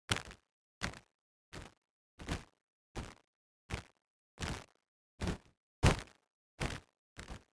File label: synth dirt plus pebbles; Country road (dirt and pebbles); The NIW Project; NIW: Natural interactive walking